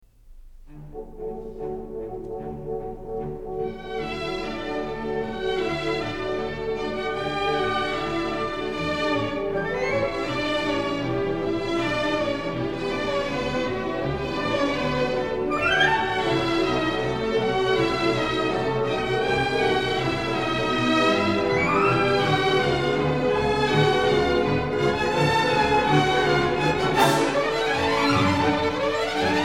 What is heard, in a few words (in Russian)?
Оркестр, Щелкунчик; Щелкунчик, соч. 71, действие I картина 1 2, Марш